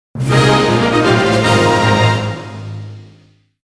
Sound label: Mission Success Jingle; fire emblem musou fuuka setsugetsu original soundtrack 2023